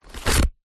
food egg cartoon cardboard rip; zapsplat

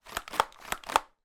toy water pistol pump action x2 dry fire

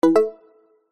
notification digital

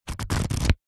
household book pages flick fast; zapsplat

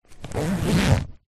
tent in bag unzip

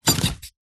household chair sofa sit down creak; zapsplat

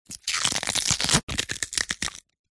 horror bone break snap twist crunch; zapsplat

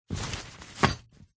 foley tent pegs in small fabric pouch set down

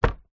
footstep stamp wood panel; foley; zapsplat

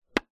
wooden box jewelery close; foley

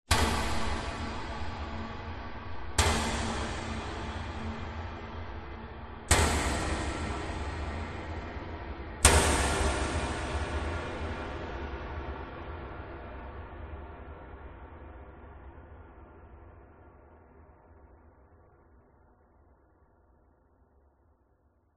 sound design metal huts x4 huge long reverb

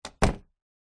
household door backdoor close; zapsplat

